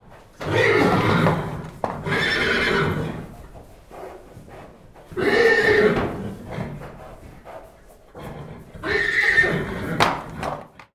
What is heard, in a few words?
horse